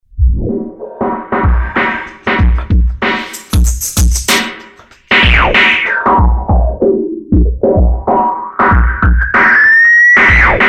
external source filtering, Novation Bass Station II; TEST: external source filtering; analog monophonic machine with subtractive synthesis